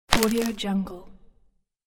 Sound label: rotten tree branch crack; nature sounds; خرد شدن شاخه پوسیده